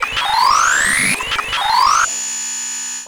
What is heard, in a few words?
time glitch transform vector